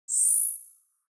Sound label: ui classic zoom out